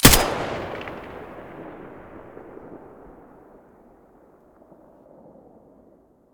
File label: M4A1 muzzle stereo